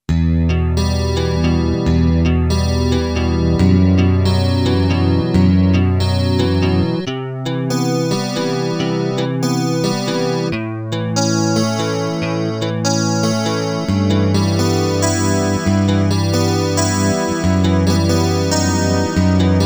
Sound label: Dream Guitar